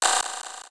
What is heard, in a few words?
Shutter modi